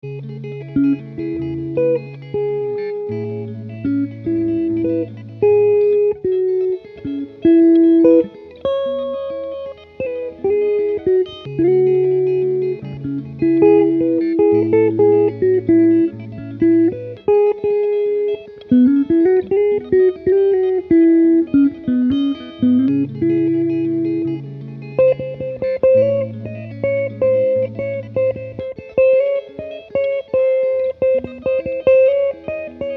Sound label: rain